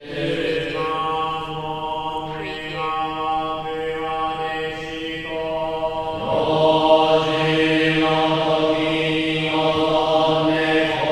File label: monk Buddhist ceremony drone chant males bells Tokyo Japan